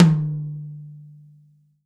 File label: TOM, Real Drum Kit